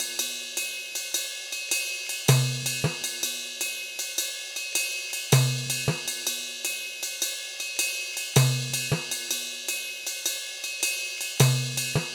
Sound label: Cymbal Ride, Cinematic Funk; Sonic Foundry (Sony Creative Software); Cinematic Funk Urban Tone Poems